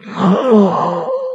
die, mp actor

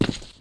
human step

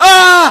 killed

